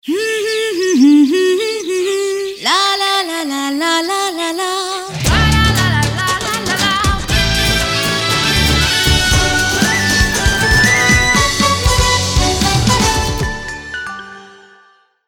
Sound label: ringtone fun unlimited